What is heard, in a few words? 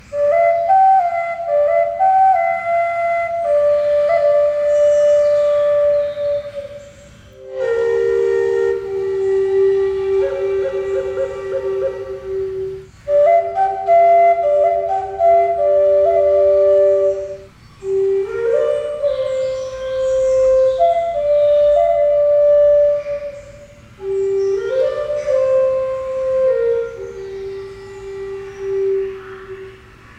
Flute